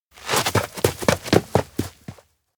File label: steps outdoor wind corridor run; anemoi